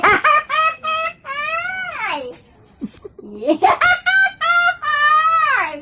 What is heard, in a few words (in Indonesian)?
ketawa nenek